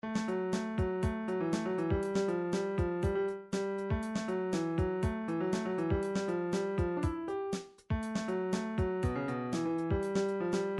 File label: funk, midi